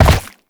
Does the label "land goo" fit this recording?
Yes